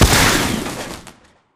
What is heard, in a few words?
Explo Damage State Light; AI Reaper; Titanfall 2